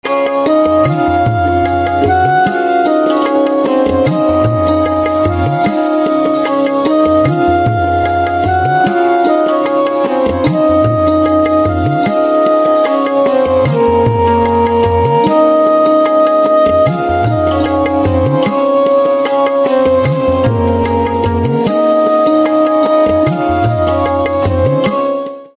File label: Organ